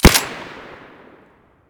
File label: saiga silencer stereo, weapons